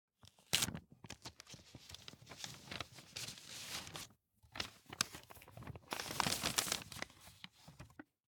Open An Envelope; LOOPERS

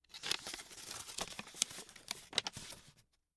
Open A Paper; LOOPERS

